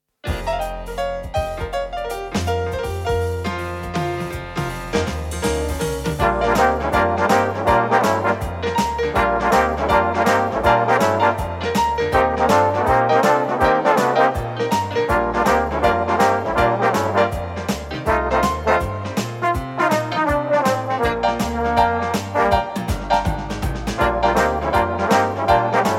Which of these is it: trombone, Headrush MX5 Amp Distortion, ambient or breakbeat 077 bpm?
trombone